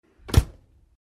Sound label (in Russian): dver holod